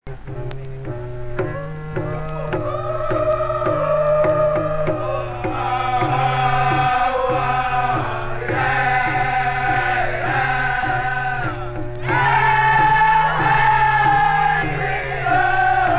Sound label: Pig Hunting Song trumpets NE coast New Guinea; Aitape